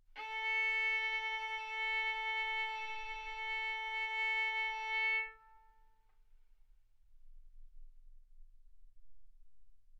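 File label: Violin arco mf A string A4 B4 stereo; sul A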